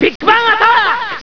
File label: big bang